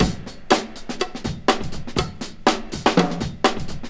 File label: 123 bpm drum beat f sharp key